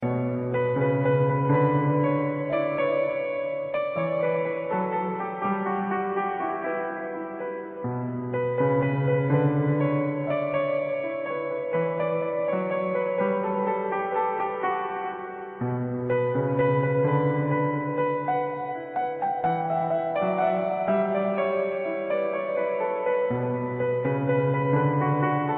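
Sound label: Sad Instrumental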